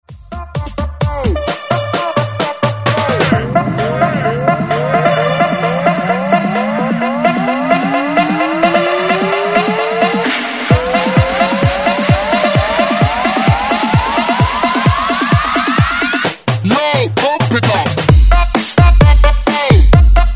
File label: 130 Breaks Mix; DJDOLLS